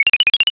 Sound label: processing